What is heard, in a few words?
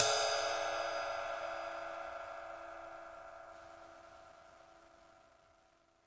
big ride; single hits; Big Believable Rides